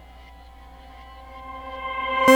FARFISA ORGAN

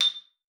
A6, xylophone; Xsample VOL-4

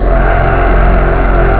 old choir; ST-XX Amiga Soundtracker Sample Packs

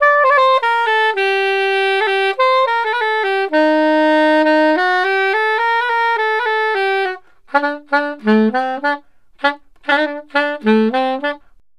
SAX, Balkanish Traditions

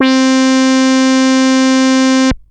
Techno leads, hits and riffs

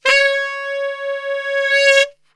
C#3 sax

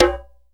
MD CONGA SL, CONGA; Cuba

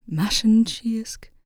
MOURN, CELTIC, World Voices; ILIO; Vocal Planet VOL-5

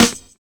RIDE BEAT; Univers Sons

